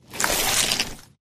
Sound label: human form long move; flood